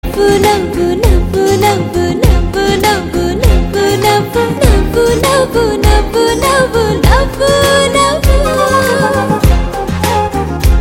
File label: Humming Ringtone